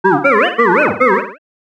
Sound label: mountain shout